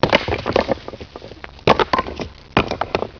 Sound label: debris